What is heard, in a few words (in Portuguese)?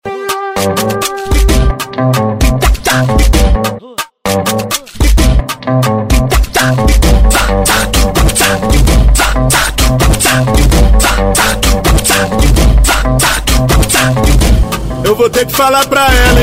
especial eletro funk